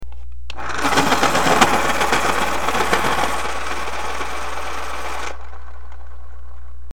electric Pencil Sharpener

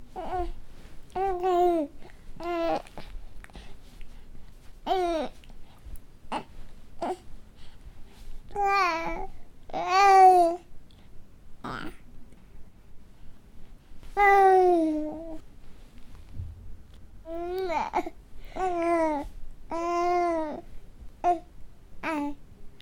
2 month baby gabbling

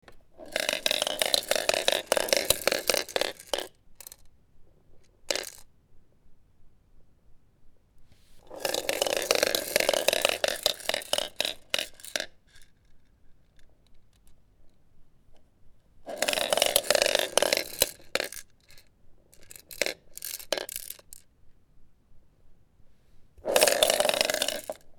rolling baby rattle toy

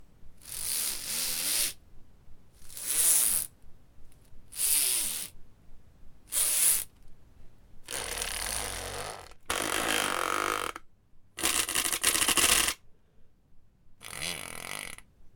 various sounds of a ripped cord

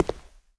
boot, footsteps

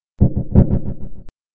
fireworks simulation

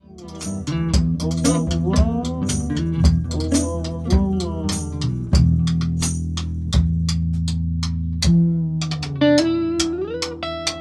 Blues; fin intéressante

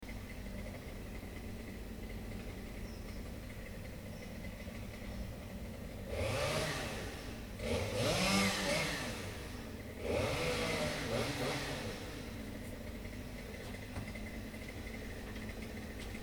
chainsaw

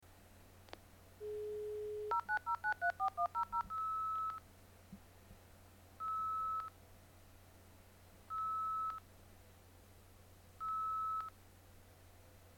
dial-up connection failed

